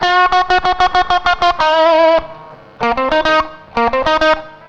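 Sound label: distortion guitar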